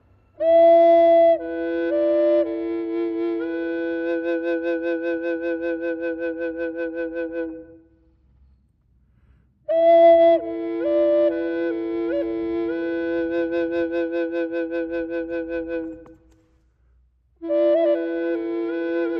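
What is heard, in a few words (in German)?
F ohne Effekte, drone flute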